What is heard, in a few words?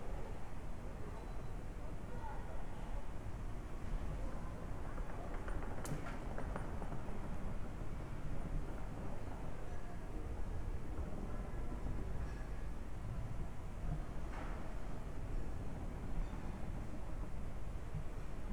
office, ambiance